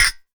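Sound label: finger snap, percussion; FX; AKAI S6000 CD-ROM - Volume 5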